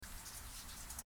rainfall

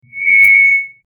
Microphone feedback

